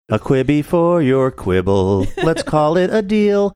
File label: singing a quibi for your quibble let's call it a deal